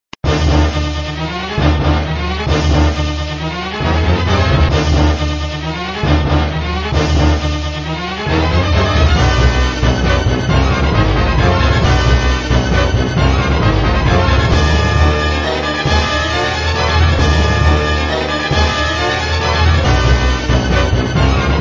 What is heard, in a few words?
Music, flipper; sttng